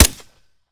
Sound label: fire sup, glock; weapons; Urban-Coalition; Decay; arccw ud